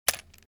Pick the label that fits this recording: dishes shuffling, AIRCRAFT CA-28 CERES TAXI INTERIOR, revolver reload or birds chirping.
revolver reload